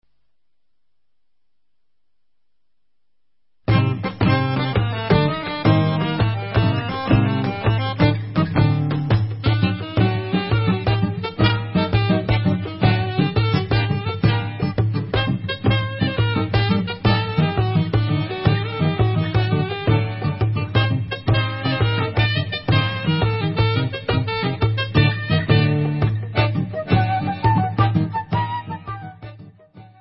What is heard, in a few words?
Instrumental